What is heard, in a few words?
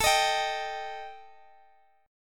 G Suspended 2nd Sharp 5th